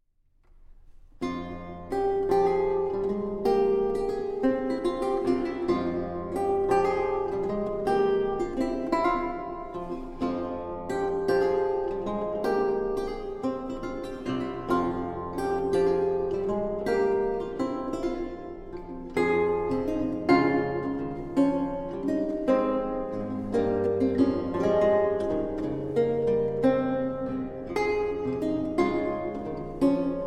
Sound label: Menuet, Lute suites; La gambade; Charles Mouton